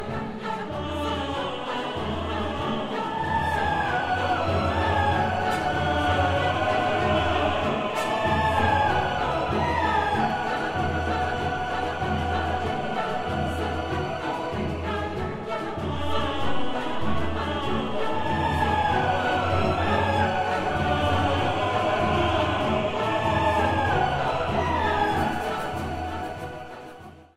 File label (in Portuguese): caxambu e puita percussao